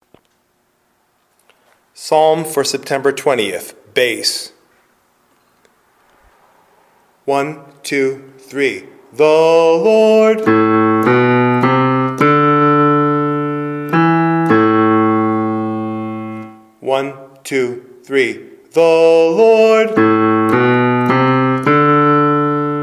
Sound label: bass, choir; psalm54